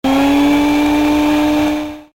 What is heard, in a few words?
Electric Mixer